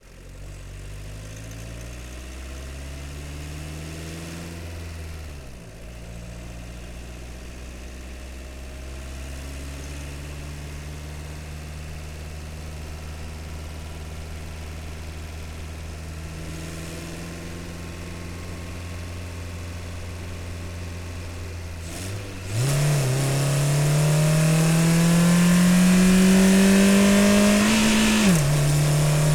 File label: Citroen C5 - ONBRD - GEARSHIFTS - Drive Stop Off - ENGINE - Left; Pole Position; Citroen C5 2002; DPA4061